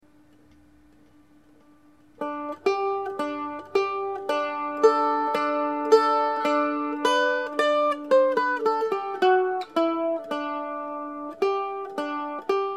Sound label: Mandolin